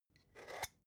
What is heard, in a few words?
spice jar scratch tonal open slow; industrie wirtschaft; gastronomie